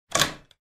Lever; the 39 steps

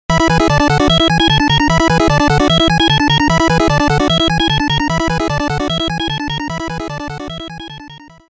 Coin (8-Bit); DuckTales Remastered

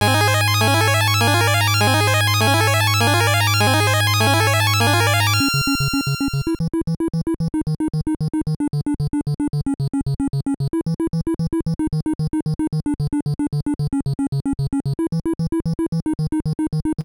Burning Bell; deadly towers 1986 nes

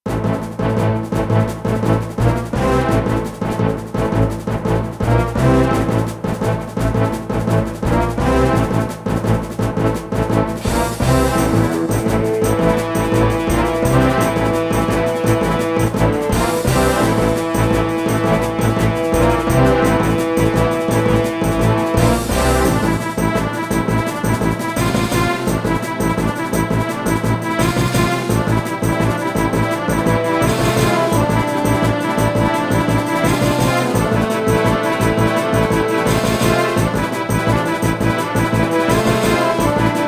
Fight; heroes of wu lin online online windows gamerip 2008

